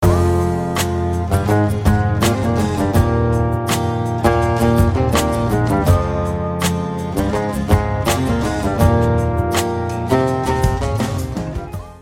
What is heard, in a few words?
sweet guitar